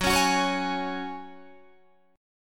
F sharp Suspended 2nd, Guitar, DADGAD; 16,16,16,18,16,18; down

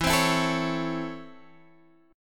Db Minor Major 7th Sharp 5th E

